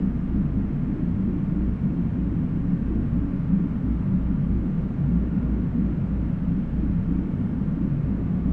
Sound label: drone machine